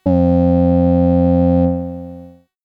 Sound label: Piano Raw; CRAFTsynth 2.0